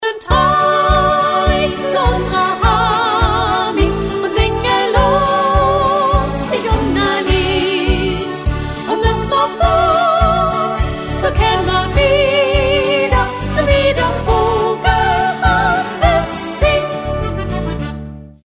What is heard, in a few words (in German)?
schneeschuhfahrermarsch